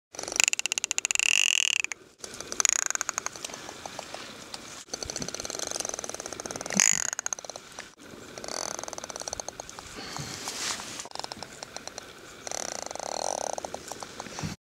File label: Salamanders; سمندر